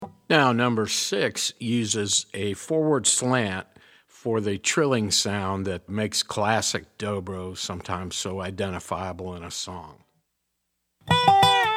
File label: resonator pedal, steel guitar